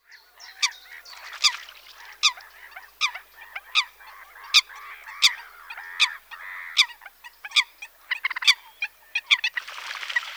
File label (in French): foulque macroule